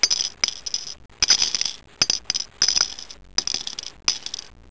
DROP; HOUSE

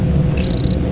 ENGSCROL

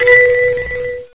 METALLIC

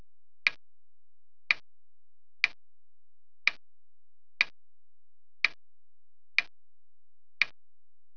TIC

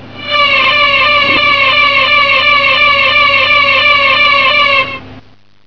ALARM

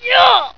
yeow

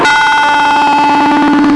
death

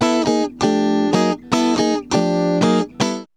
JAZZ

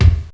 REVRB KIK

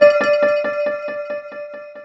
BELL DLAY

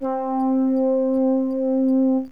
JUPITER, SYNTH; JUPITER6

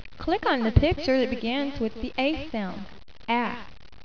AHH

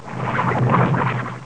GOOP

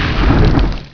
FL HIT; BLAZE

